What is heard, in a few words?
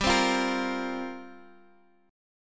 F Suspended 4th G; Fsus4/G strummed; JGuitar